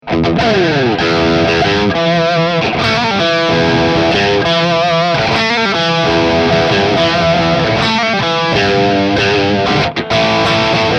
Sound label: hx tubescream